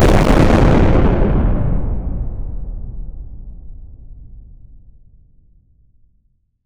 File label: bomb blasting, detonation